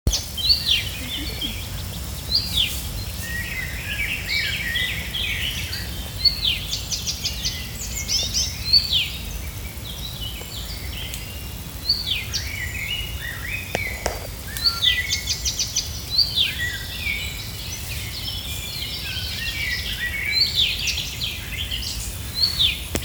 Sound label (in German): buschrohrsaenger